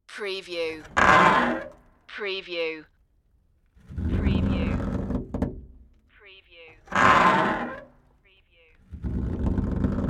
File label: trap flap